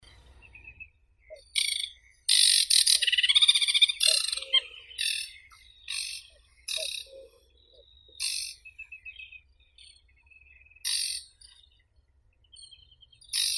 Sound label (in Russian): kamishovka vertlyavaya acrocephalus paludicola